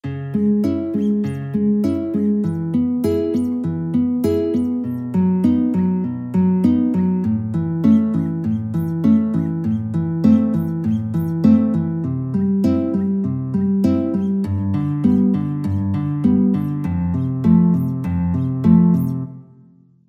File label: guitar